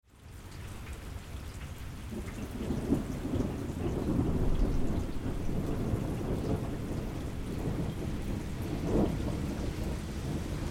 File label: March Thunderstorm; incinnatti